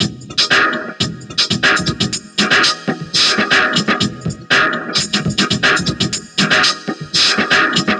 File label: Vinyl Loop; Sonic Foundry (Sony Creative Software); Esoterik Beatz